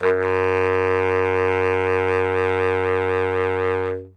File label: notes f sharp, sax; Dirt-Samples